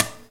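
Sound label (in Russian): удар пластмассовой ложкой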